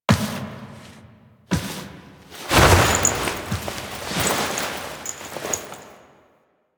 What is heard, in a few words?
Angel Reveal Foley; Warframe; Zariman Quest